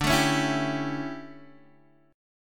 D Major 7th Suspended 2nd Suspended 4th D, Guitar, Open G